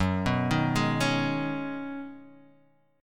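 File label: B 6th Add 9th G flat, guitar chord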